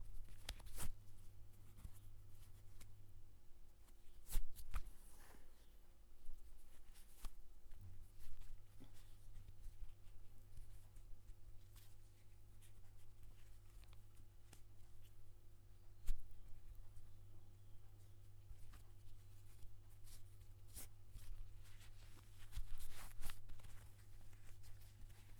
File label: Tying Shoes